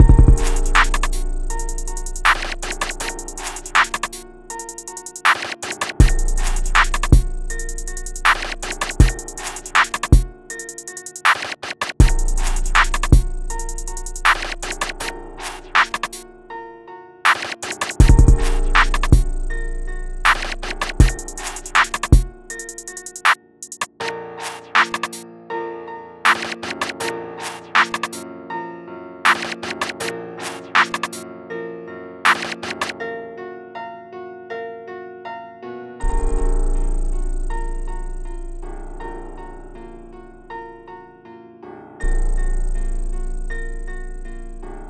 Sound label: silencer